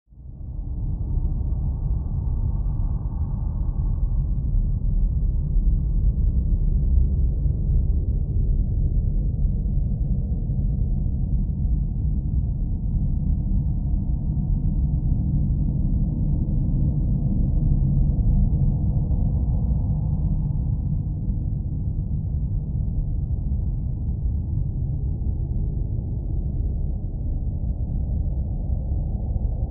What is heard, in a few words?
Ambience Undergrou